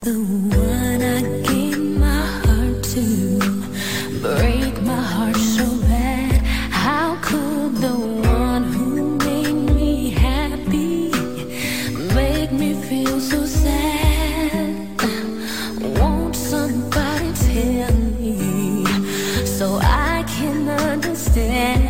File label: sad tune ringtone